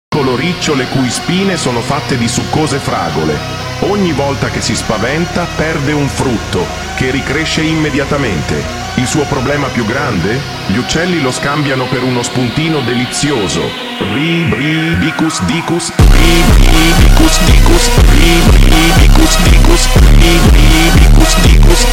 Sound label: Funk slowed